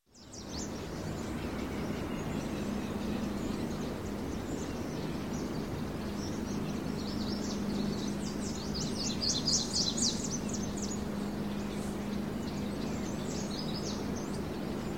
Birds, Various Country Ambience, Early Morning; Sound Ideas 2000 Series

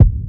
Menu Horror; Menu FX

